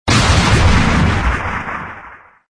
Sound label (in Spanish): explosion violenta